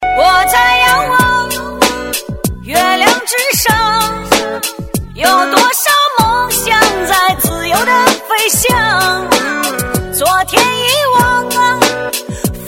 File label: Chinese Song